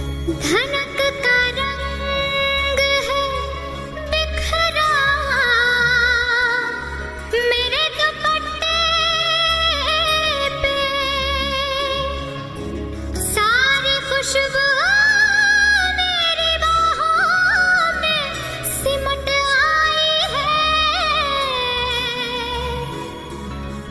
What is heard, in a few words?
Old Hindi Song Ringtone